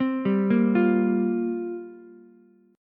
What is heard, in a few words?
F Suspended 2nd Suspended 4th F down Ukulele; arpeggiated; JGuitar